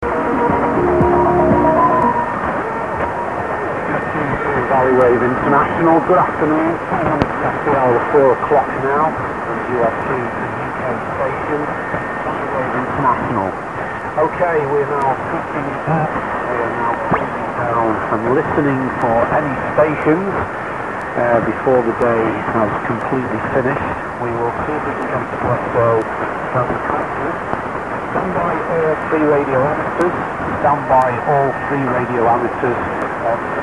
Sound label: Valley Wave